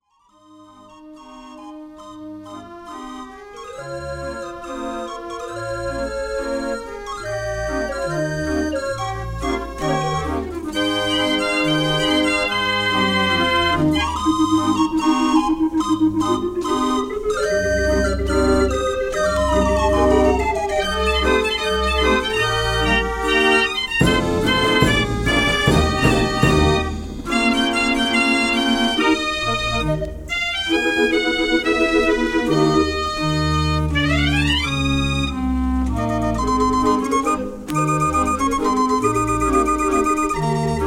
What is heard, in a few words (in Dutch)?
draaiorgel